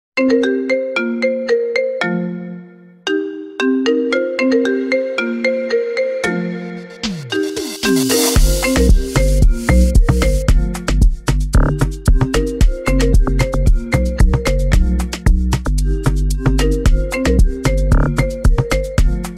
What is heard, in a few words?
Iphone Ringtone Amapiano Remix